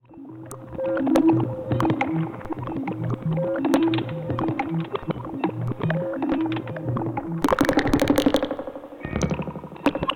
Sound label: pinging, Modular; live recorded experimental soundscapes; modular synthesizers